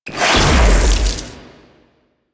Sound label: Beam Shot, Weapons; Warframe; Archwing; Larkspur Prime